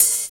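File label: hi-hat, hi-hat real; Sound & Vision - Gigapack I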